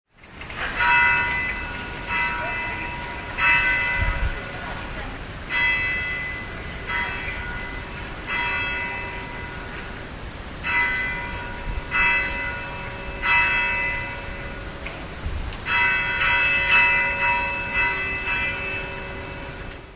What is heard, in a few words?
bells angelus